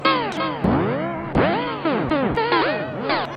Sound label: Turntable Piano